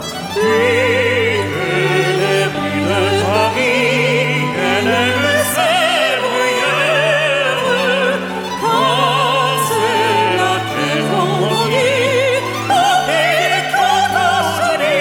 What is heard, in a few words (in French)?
sonnerie tel; bruyeres correziennes